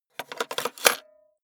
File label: cassette into cassette player